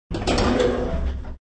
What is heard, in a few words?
Elevator Door Open; bobtherobber2